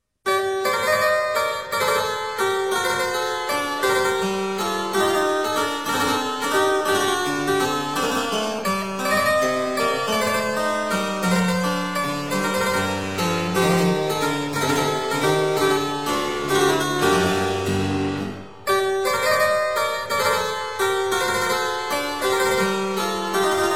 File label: clavecin; Couperin; pieces de clavecin book1; les abeilles